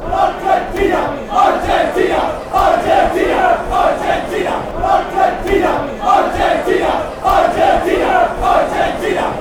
argentina, chants

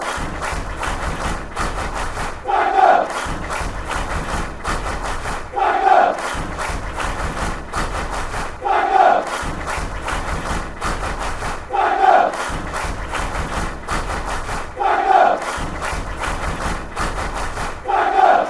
dagenham clap

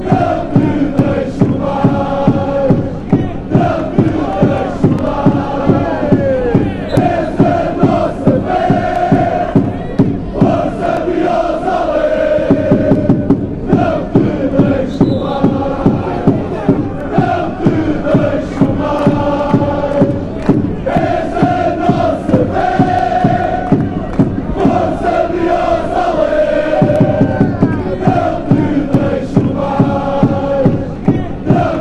coimbra chant

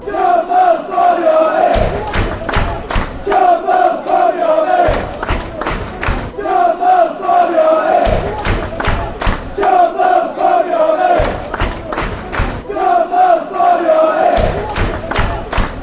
barmy army

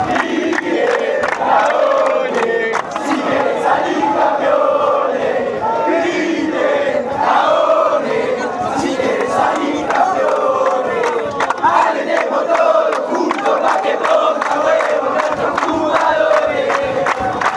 alentemos todos juntos, fan chants

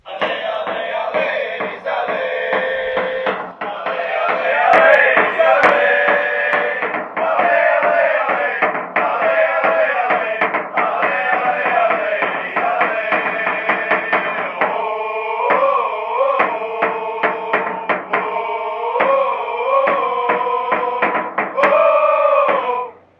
allez allez allez allez, fan chants; eddies

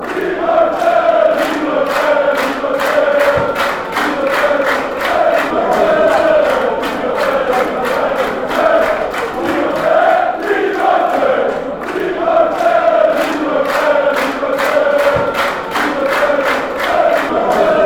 willem ii chant

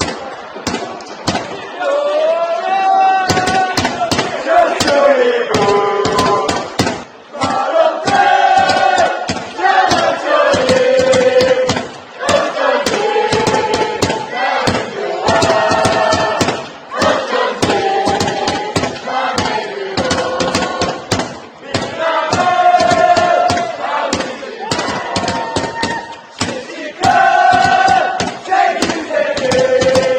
a man in yellow shirt, fan chants

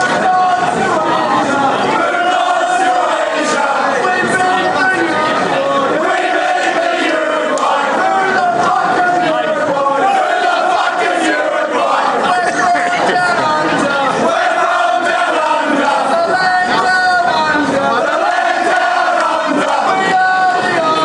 green yellow army fanchants

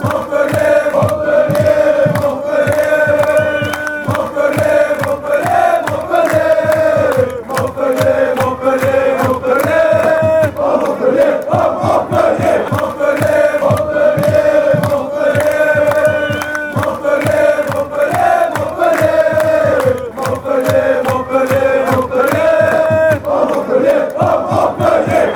chants; montpellier

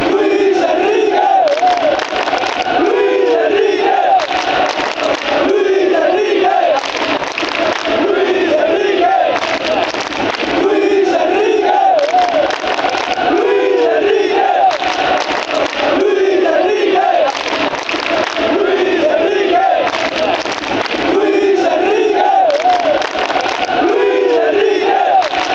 fan chants; luis enrique

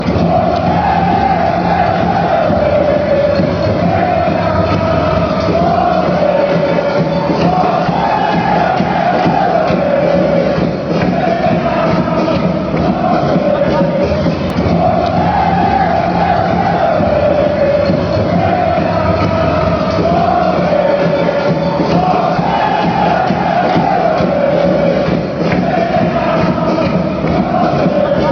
ole, fanchants; salt lake

